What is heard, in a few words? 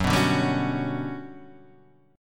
G Major Sharp 11th F sharp, chord